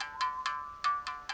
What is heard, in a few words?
STR Mouth Bow Phr; Roland LCDP11 Africa VOL-1; S.MouthB Phr